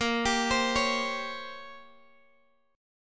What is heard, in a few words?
Bb Minor 6th Add 9th, Guitar, Open G